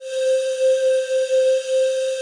PAD, ATMO PADS; Best Service; XX Large

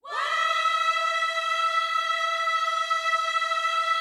WHOA E 5; Spectrasonics; Vocal Planet Vol.1 Gospel; akai

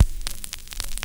CRACK; E-Lab; X Static Goldmine 2; akai

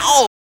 SHOUT; E-Lab; X Static Goldmine 1

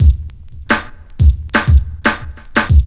LOOP; E-Lab; X Static Goldmine 2; akai

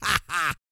GRUNT

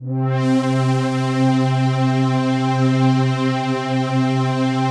PAD, ATMO PADS; Best Service; XX Large

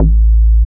BASS

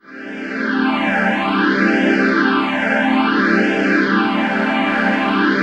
PHASE PAD, ATMO PADS; Best Service; XX Large

